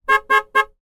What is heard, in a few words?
car horn beep x3 short